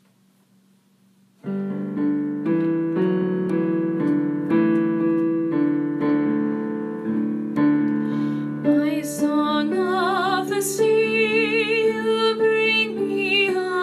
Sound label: soprano